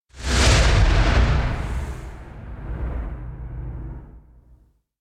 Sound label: Whoosh Explosion; Hollywood Edge; sound designer's toolkit v2